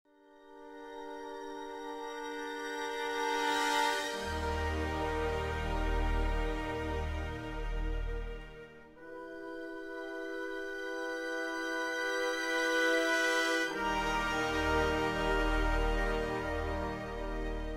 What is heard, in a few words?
Loading Screen; The Amazing Spider-Man 2